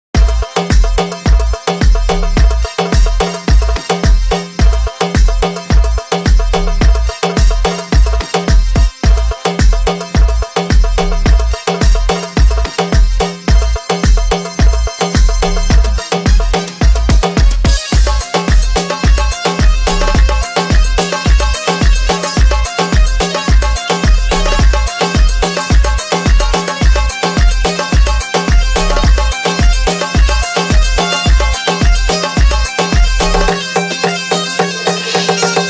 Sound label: remix arabic